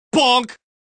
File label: Bonk; TF2; Scout